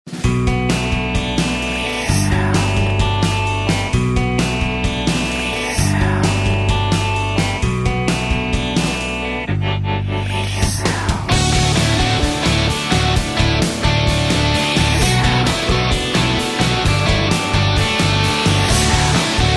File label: Indie Dreams - 130BPM, rock